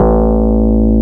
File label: PUNCHREEDS; Wizoo - Powered DX